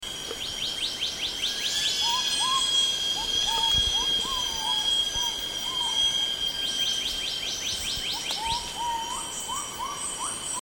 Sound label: Sound of Black-naped Monarch at Pinang Jatus, Gunung Lumut; Awang Rusli Bin Ma